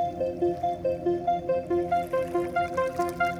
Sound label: Growing Arp